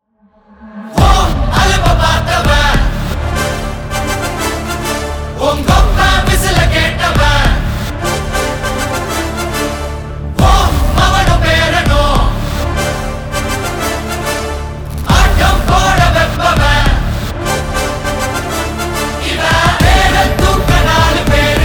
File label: Tamil; Tamil 2025 Songs; Jailer 2